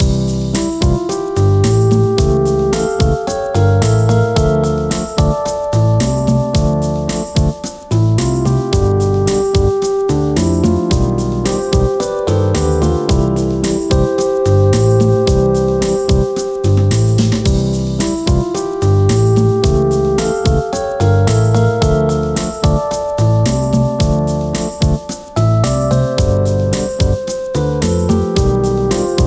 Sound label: Village Game Theme Loop